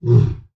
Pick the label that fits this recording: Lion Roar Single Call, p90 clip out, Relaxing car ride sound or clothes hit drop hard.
Lion Roar Single Call